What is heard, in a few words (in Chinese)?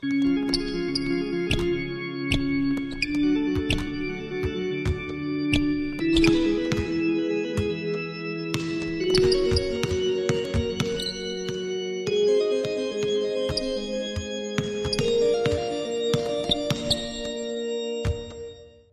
风琴上行; nba